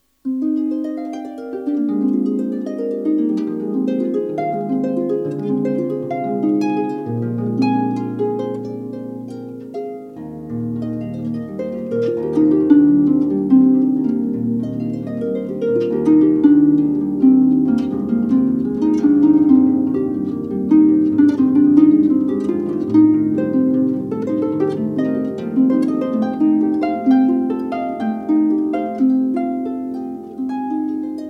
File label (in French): harpe